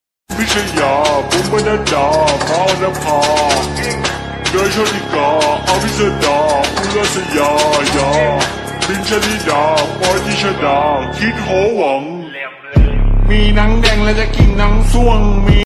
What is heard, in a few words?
black background music mobile ringtone